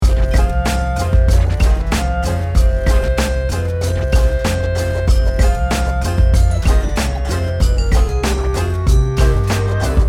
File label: Loop; Ford